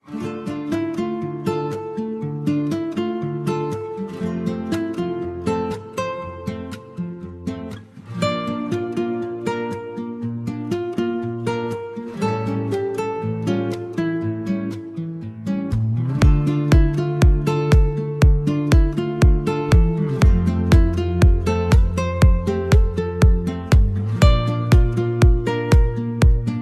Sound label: Nostalgic Acoustic Pop Intro, Guitar Clean Intro